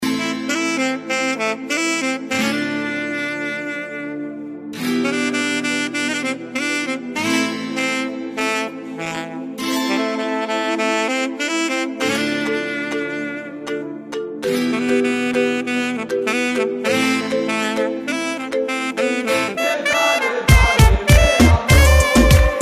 Instrumental

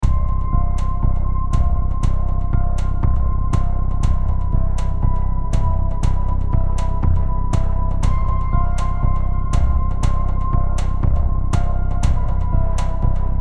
true crime thriller loopable music; donx27t look down the basement

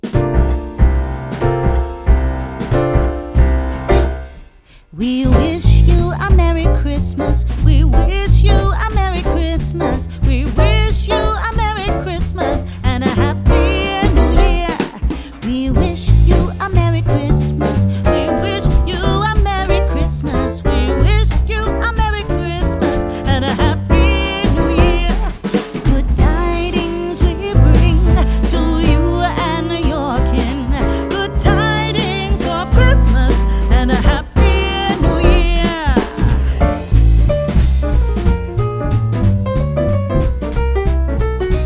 We Wish You a Merry Christmas Jazz

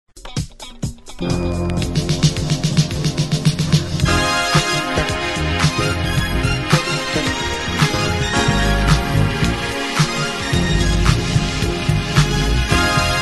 Unknown Modern Soul From Radio Show; Funk Inconnu

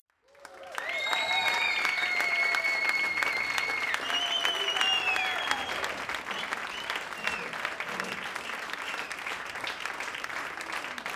cheering crowd loud whistle

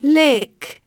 Lick

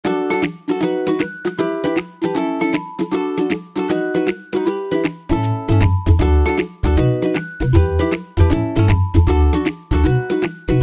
Tutorial Background Music

